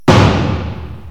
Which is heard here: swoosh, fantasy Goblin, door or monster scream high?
door